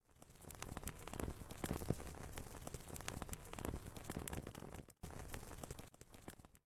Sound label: Incense burn; Phasmophobia